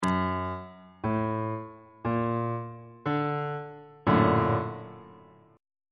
a sharp augmented major 7th 2nd inversion on bass clef